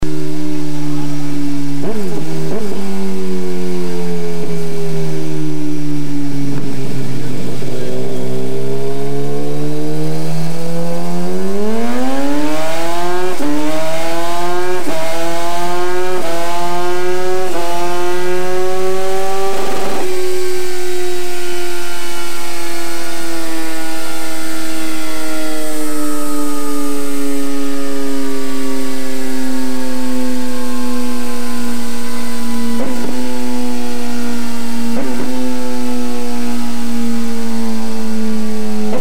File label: Kawasaki ZX12 Muzzy pipe; 2000; 174hp; 200mph